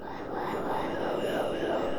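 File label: Music dpad Black Hole 120; from dust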